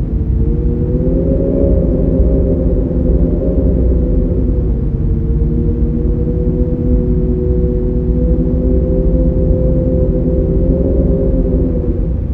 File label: Slave Cave Opening Loop